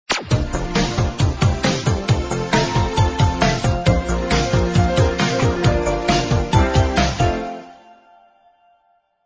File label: uptempo